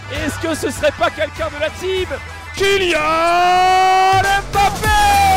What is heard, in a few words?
music; kylian mbappe